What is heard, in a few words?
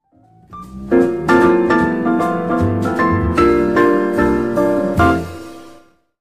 bells